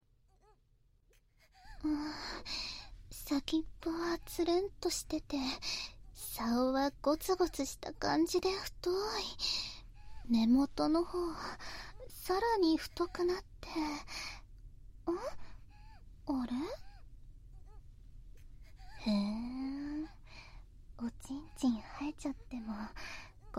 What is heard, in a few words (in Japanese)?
喘ぎ声あり; 転校生に弄ばれて